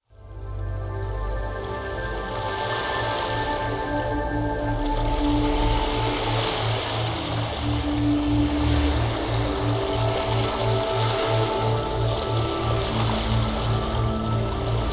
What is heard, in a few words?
Deep Delta Sleep Isochronic Tones Brainwave Entrainment